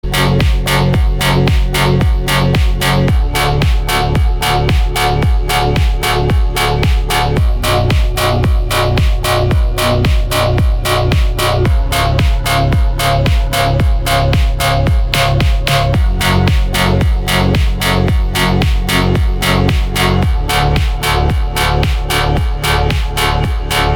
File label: Melancholy